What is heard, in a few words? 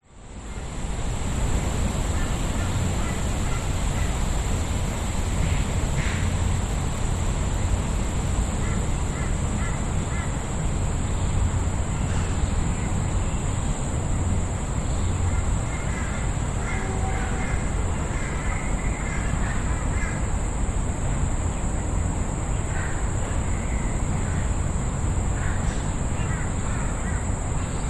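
park ambience evening occasional construction distant city hum birds insects; public places; botanic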